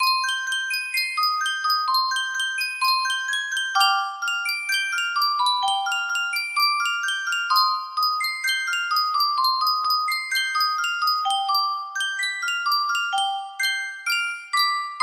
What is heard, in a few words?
thorens music box, melody